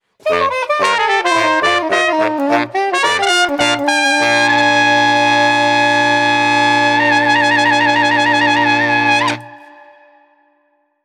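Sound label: Street Brass New Orleans Transitions, 95 bpm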